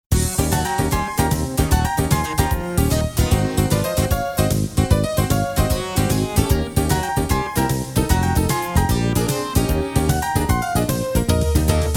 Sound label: Hot Cross Blues WITHOUT melody fade